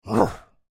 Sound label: Creature Effort Huff, Human; Breathing; Big Room Sound